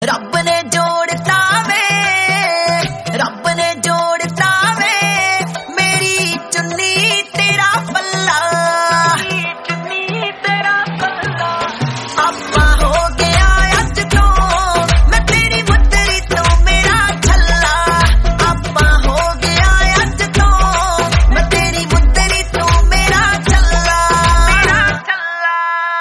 punjabi song tone ringtone; bollywood ringtones

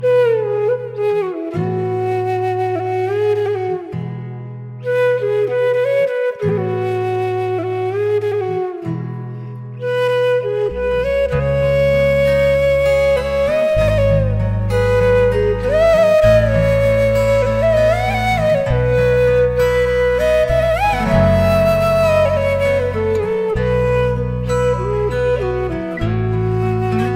on flute ringtone, bollywood

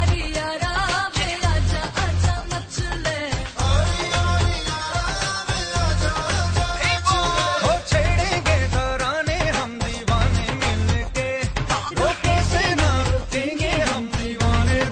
hindi musical song ringtone, bollywood

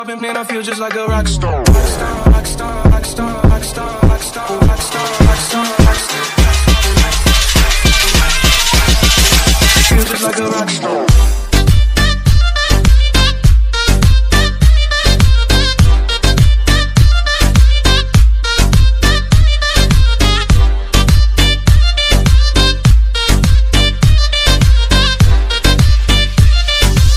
rock new english music ringtone

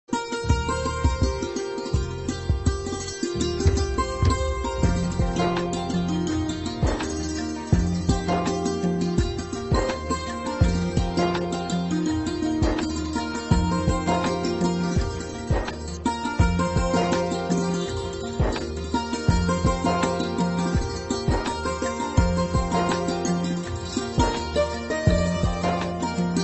romantic instrumental ringtone

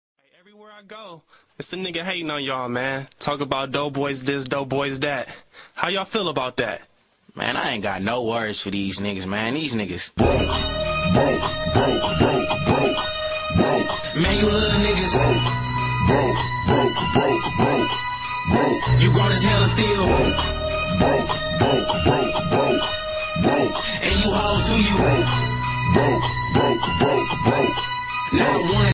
chill ringtone

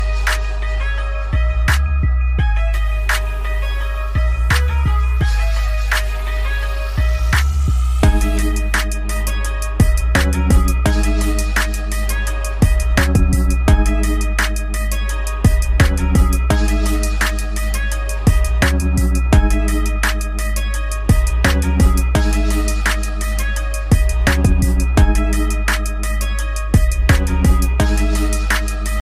music ringtone, english

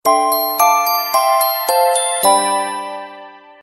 sony xperia sms xperia z ringtone

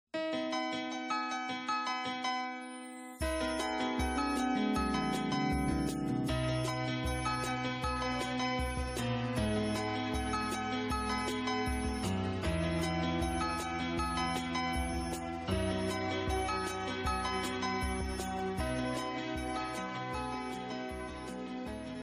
iphone piano fast ringtone

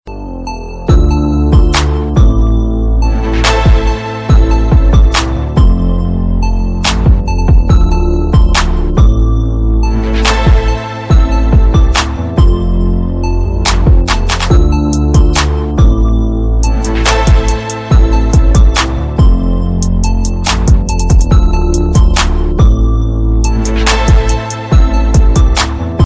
motivation ringtone

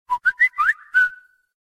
whistle; galaxy s3

